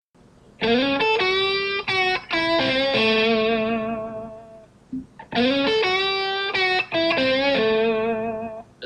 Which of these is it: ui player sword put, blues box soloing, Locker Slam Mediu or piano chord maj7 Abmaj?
blues box soloing